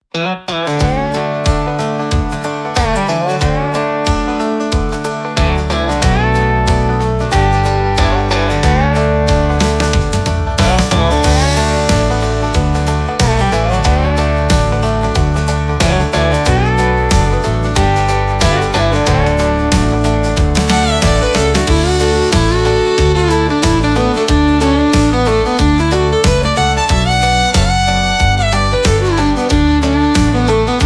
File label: walk around 92bpm, Harvest